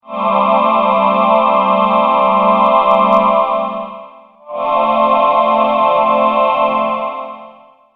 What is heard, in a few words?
choir